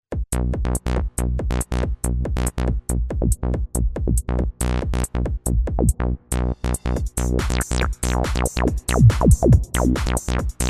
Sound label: Dance